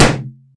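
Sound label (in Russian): короткий чёткий звук лопающегося воздушного шарика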